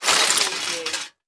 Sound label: Debris Glass Plastic